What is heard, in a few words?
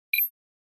Flash Beep Pulse